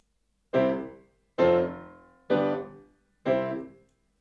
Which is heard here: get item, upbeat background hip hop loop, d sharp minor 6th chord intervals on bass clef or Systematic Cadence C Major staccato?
Systematic Cadence C Major staccato